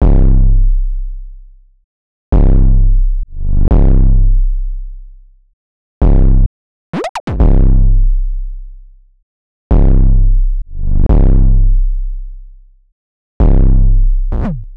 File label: 130 BPM C Min 808; Cymatics; Wonky Trap; Killed This